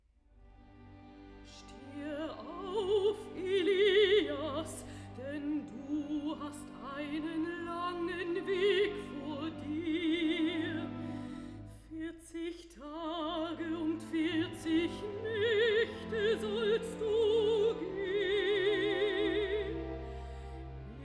recitative alto baritone; felix mendelssohn; stehe auf elias

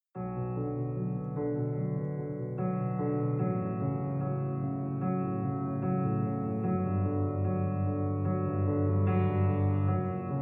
scarlet massif piano

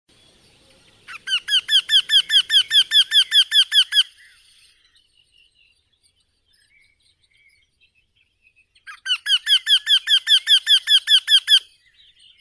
vertisheika jynx torquilla

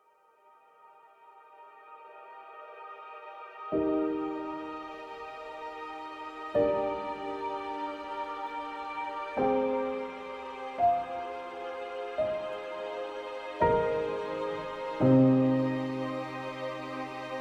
instrumental mix

